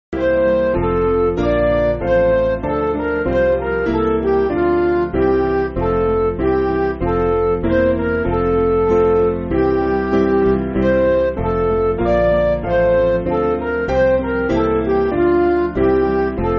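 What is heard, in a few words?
Midi, Music Backing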